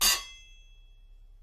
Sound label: metal hit